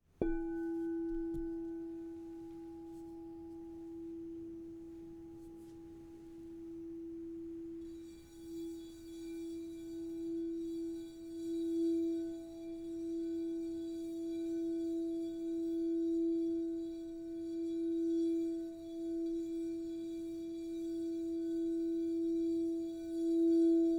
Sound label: Crystal Tones Singing Bowls